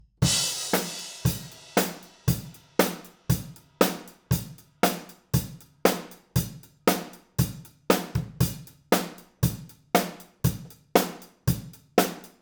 Drums; Bomblet

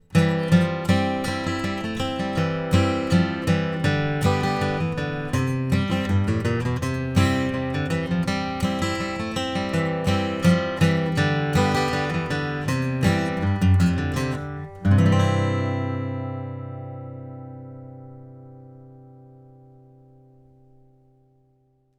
ACC GUITAR FET

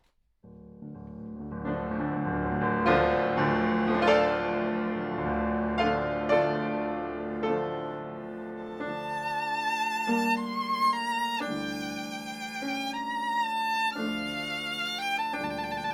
PIANO VIOL; SOYUZ

